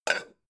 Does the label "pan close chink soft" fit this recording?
Yes